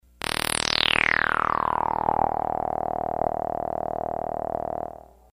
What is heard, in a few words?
thin sweep